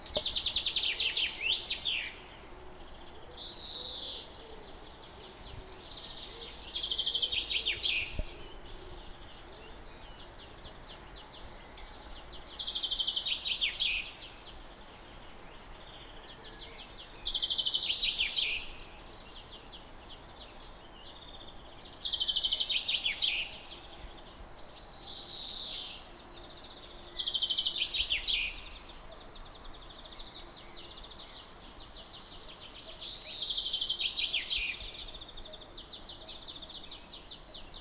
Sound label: birds from balcony summer morning; Recorded mid morning (around 10am) on midsummer's eve. The balcony looks onto the back garden, behind that a small street and another lawn